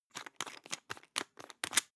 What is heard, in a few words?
toothpaste screw cup open fast hard